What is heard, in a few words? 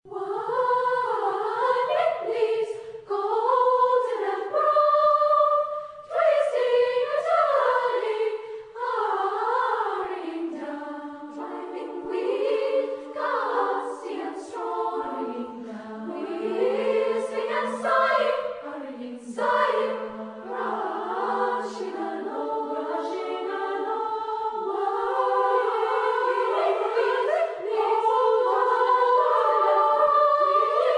Girls Choir; Taplow Girls Choir